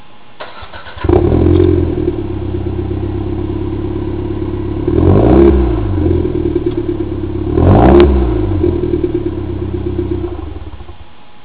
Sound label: MR2 Rev; 94 MR2